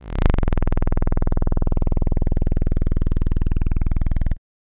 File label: Black Lead; Blackbox; Suonho